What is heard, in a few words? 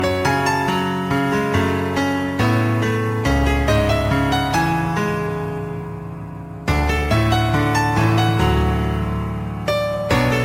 piano ringtone; piano in heaven